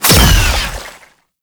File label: Dead Zone Cast; Warframe; ManInTheWall; Slinky